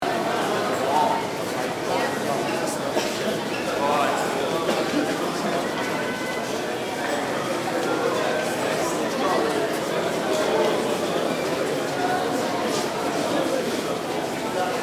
Train station large open hall with reverb loop

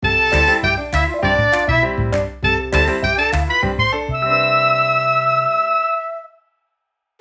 Cheerful upbeat melody sitcom music

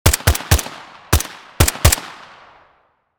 Tactical assault rifle multiple shots

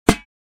Cooking pan light hit impact; utensil

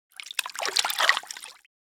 Bathtub splashing water movement

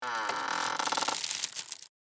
Hamper lid open creak; basket

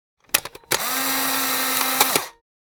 Polaroid camera shutter

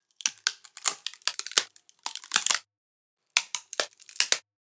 Designed cinematic wood cracks

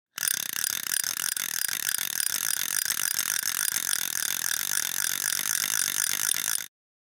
Fishing rod spinning reel

